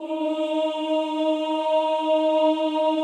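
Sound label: choir E flat 3, Women-Combi; Sound & Vision - Gigapack I CD 2 (Roland)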